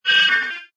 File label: Digital Beep Static Warble, Scifi; Scifi Production Elements, Set 2